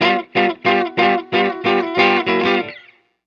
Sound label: Call And Response Guitar Licks, 70s Analog Funk; Sonic Foundry (Sony Creative Software); What it Is!